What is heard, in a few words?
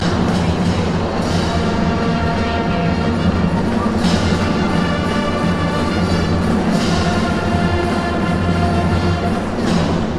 Arena Band